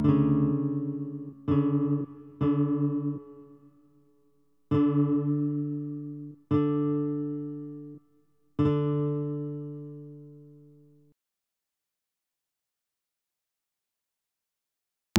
Playing Chords; betrayal at krondor

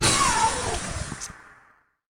Monster Death Gas

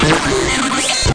merchant bought; GoNNER

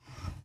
submenu close

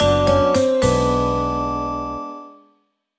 Sad Mini; basketball club story

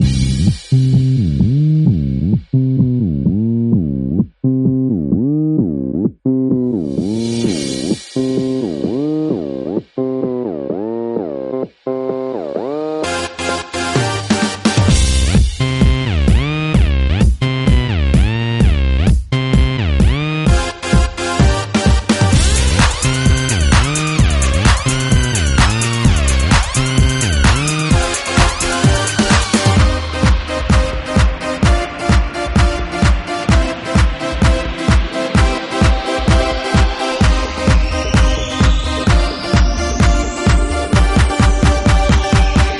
Gameplay Music; Gangnam Dance School

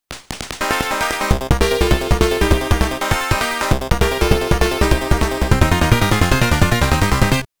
Failed!; Pit; MSX Racing Spirit

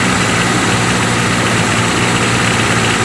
Vaz Niva Low; car simulator 2

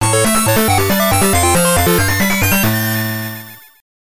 Bonus Stage Clear; Alien Crush; TurboGrafx-16

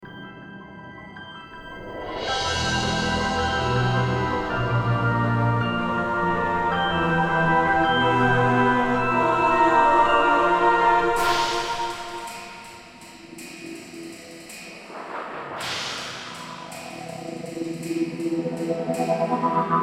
Battle Em 162bpm Loop; Disney Infinity 2.0 Marvel Super Heroes; Arachna

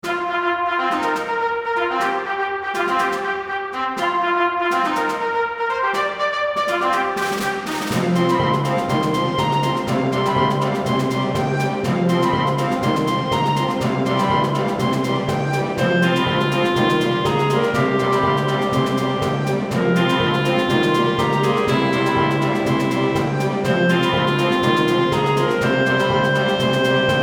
Military Tutti; Westerado Double Barreled

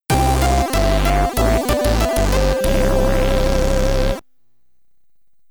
Game Over (SID Stereo); coalminer; commodore 64

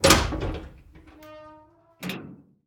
metal door open; Devour

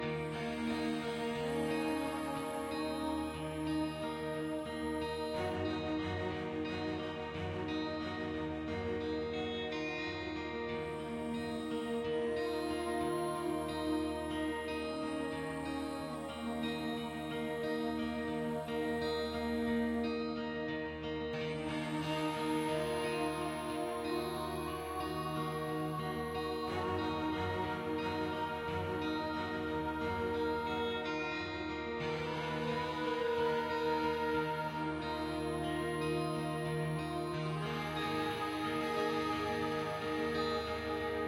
Tension Low; Great Wall

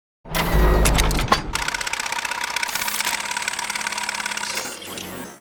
exit door key

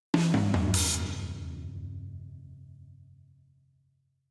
Combat Transition; Necropolis

